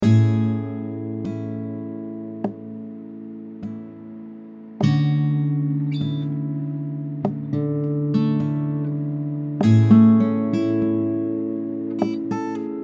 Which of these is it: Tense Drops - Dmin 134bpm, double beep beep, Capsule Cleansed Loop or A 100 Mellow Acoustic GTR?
A 100 Mellow Acoustic GTR